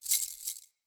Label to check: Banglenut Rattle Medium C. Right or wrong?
Right